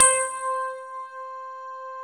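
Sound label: grand piano; AKAI S-Series CD-ROM Sound Library VOL-7; D.GRAND PF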